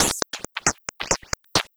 Groove 135; Dance Mega Add On Grooves

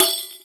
Rek Open, Percussion; Roland BK-5

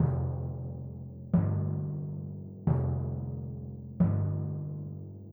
Timpani, Orchestral; Sonic Foundry (Sony Creative Software); Orchestral VOL-2; Modern 25

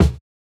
Kick, jungle breaks drum sounds

